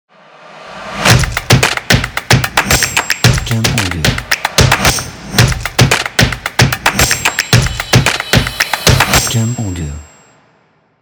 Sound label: Energetic Drums Logo